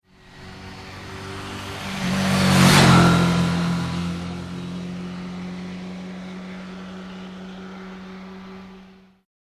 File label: Motorbike Suzuki 650 Pass Fast; Motorcycle; Tom Hutchings